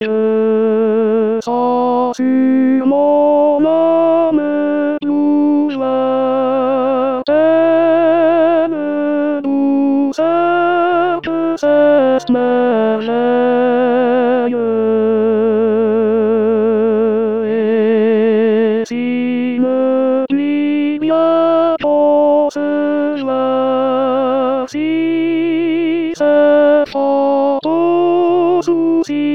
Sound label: tenor